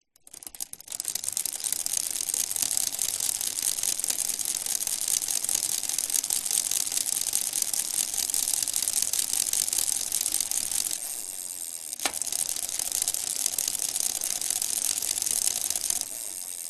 vehicles bicycle pedal then stop coast pedal again wheel hub clicks